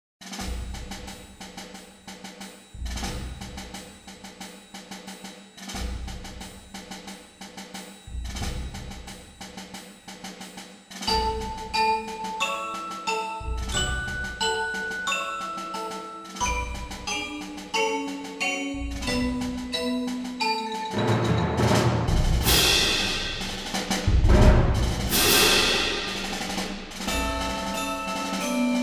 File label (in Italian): Tema percussioni